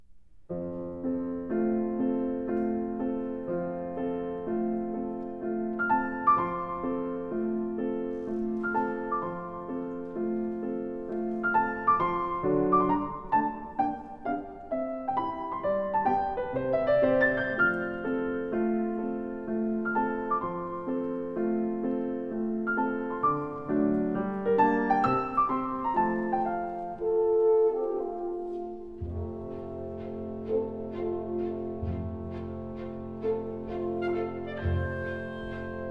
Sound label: Piano Concerto No 2; Loewe; Espaniola; Classical Push by Pentatone